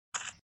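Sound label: footsteps; jiaobu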